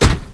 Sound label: cab door; ambience